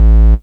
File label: Offbeat Bass A; Vengeance Samples